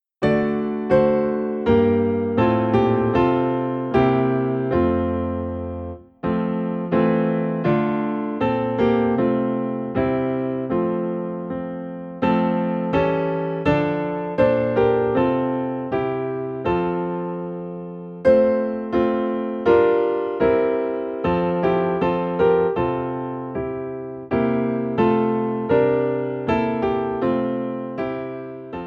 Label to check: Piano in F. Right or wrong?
Right